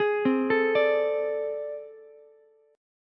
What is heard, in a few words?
A Minor Major 11th, down, Ukulele; arpeggiated; AmM11; JGuitar